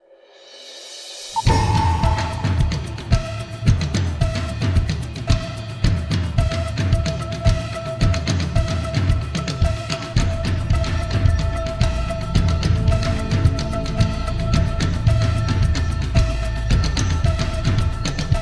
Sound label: Severe Winter Fast Tempo, music; TWC